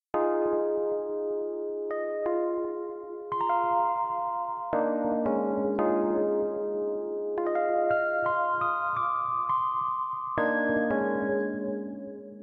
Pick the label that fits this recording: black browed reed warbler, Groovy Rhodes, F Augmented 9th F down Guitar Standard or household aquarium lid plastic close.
Groovy Rhodes